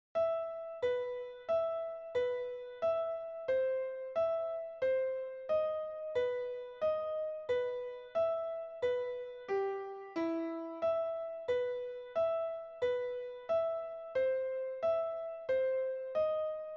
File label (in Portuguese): Balade violino 2